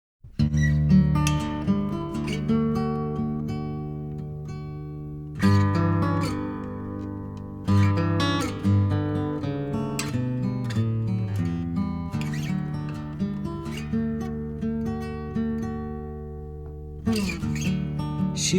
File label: Acoustic; Original Acoustic Demo